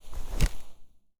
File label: AK47 Melee Swing; zps; Weapons; Firearms